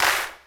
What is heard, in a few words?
clap A key; percussion; single hits one shots